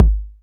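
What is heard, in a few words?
bass drum 808 Click Color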